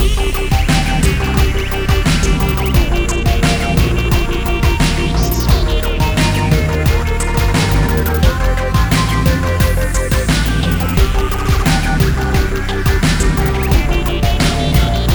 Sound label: Asteroids Inside Fast; rive wreck hack die retry; ps4